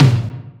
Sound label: tom, drums; virtual drum sounds